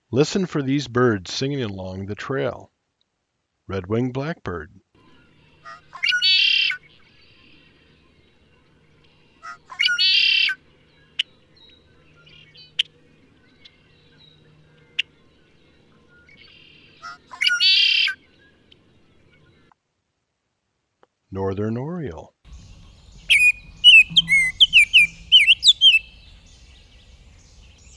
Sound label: bird songs; browns creek audio station